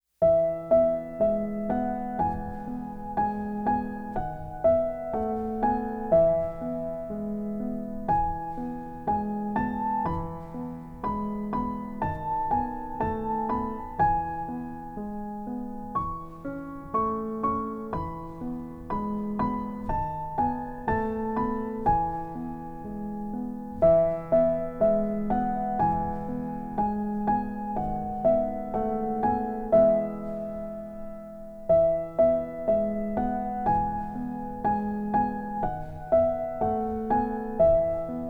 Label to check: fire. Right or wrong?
Wrong